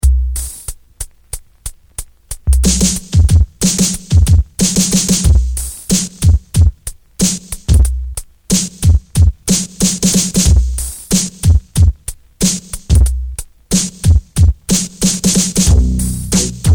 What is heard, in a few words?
Funky Bass (Dub); B-Boy Records; Phase One Music